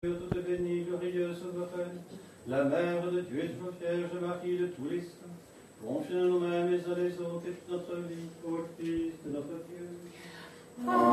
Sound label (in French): A Toi Seigneur grande ectenie, Vigile orthodoxe